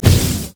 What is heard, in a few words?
baleful strike